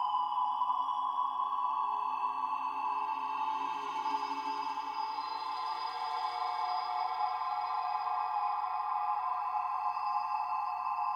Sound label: field magloop